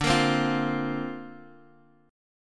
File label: D-Minor 7th Sharp 5th, D; Dm7#5; strummed